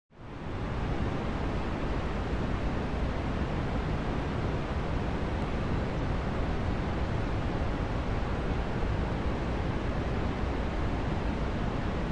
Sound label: white noise